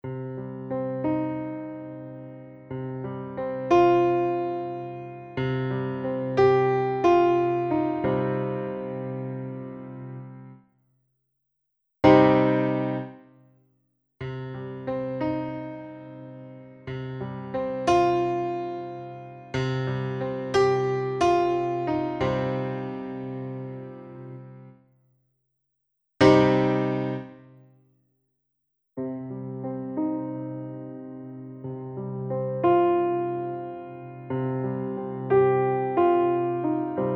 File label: Minigrand